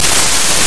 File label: Buzzz